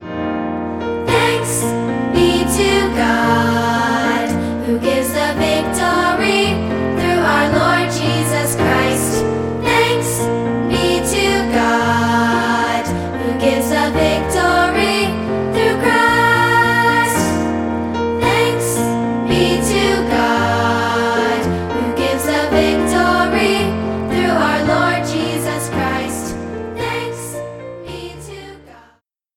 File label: Piano